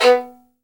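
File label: violin staccato B 2; AKAI S-Series CD-ROM Sound Library VOL-7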